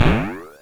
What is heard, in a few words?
Drums, Hits; DCM8